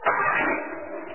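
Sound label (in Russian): быстрый старт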